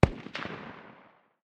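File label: light machine gun far distance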